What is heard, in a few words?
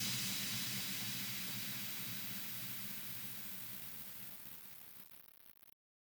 dith